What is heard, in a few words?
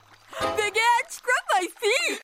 Scrub Feet